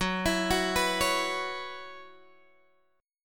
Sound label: Gb 7th Suspended 4th Gb down Guitar Open G, chord